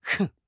フン！

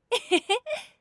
エッへへ

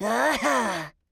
アーッハー

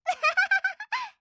あははははははは！

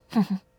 フフッ

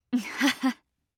ハハッ

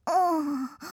んー…

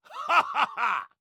ハッハッハー！

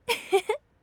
ウッフフ

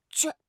ちぇっ…

